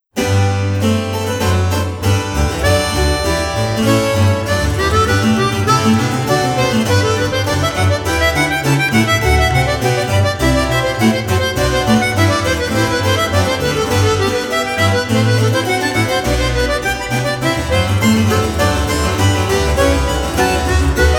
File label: Sonata for violin and continuo in G major Presto; BWV 1021